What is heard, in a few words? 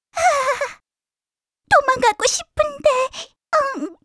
Lose Point; Pochi